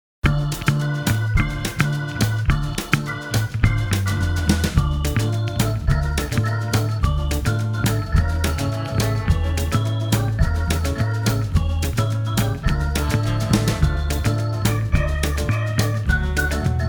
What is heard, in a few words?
retro dance